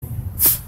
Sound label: shoot; Teemos Dart Shooter Cosplay Prop With Arduino